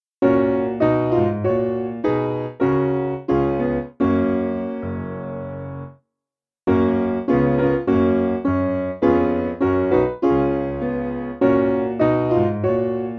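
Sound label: Piano, Free Music Backing Files for Churches; Caraf Yr Haul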